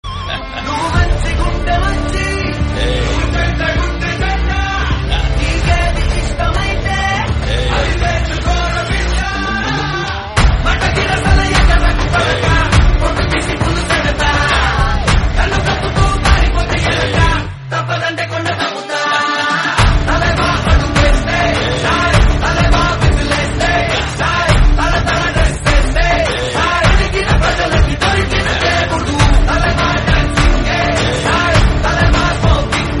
telugu song; jailer

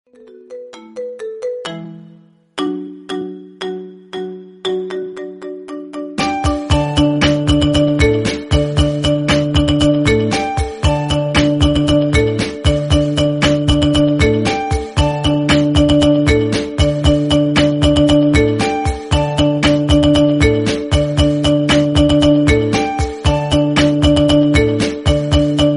marimba ringtone remix

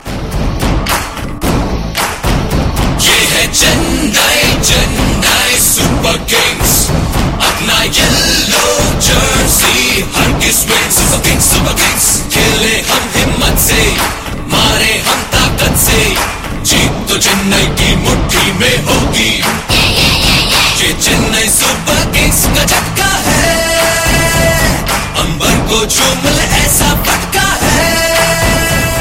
Hindi; IPL Csk Anthem

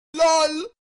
lol meme lol sound effect

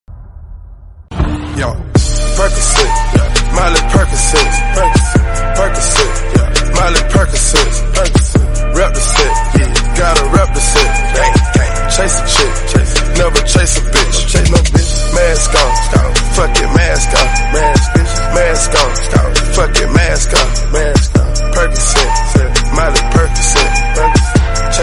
violin instrumental

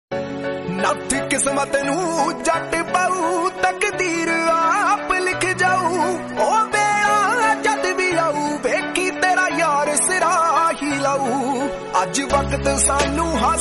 punjabi song

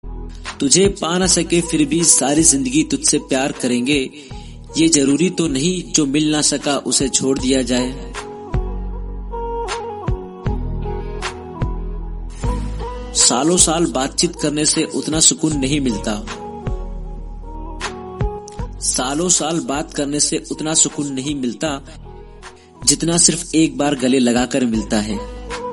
emotional hindi

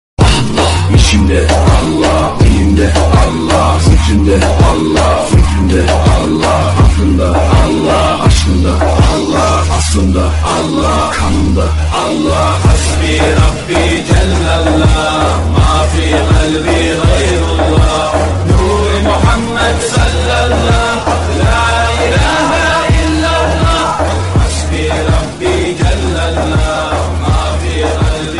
islamic song

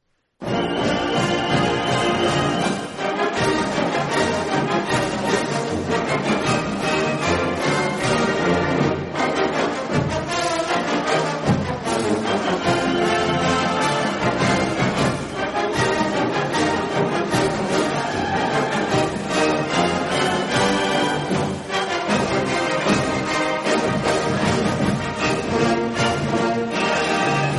fight bgm; kgf 2

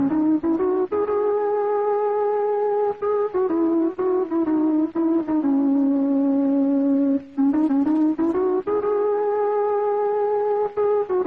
bgm tamil; punnagai mannan

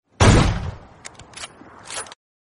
gun shot message tone; awm; pubg mobile

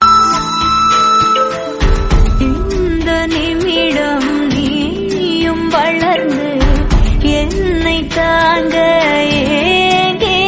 mother tamil song

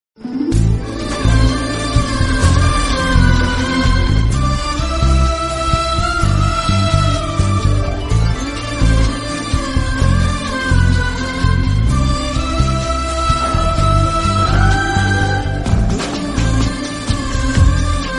romantic bgm

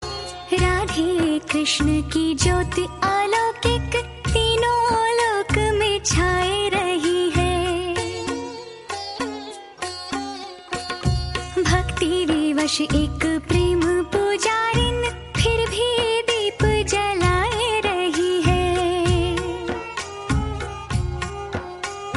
hindi bhakti song

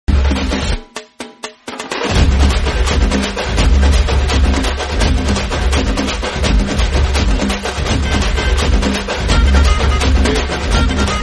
yakshagana drums master drums bgm

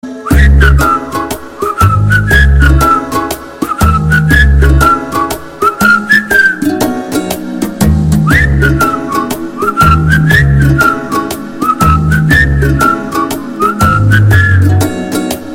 bgm south; premam

